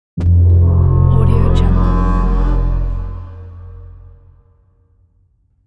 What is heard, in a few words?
viking horn